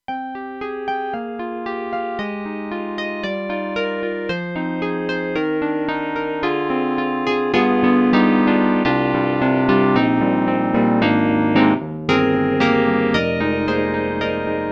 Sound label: Korg TR-Rack Classic Whirly